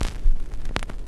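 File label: record scratch; E-MU Formula 4000 Series Vol. 5; Protozoa; Orbit Presets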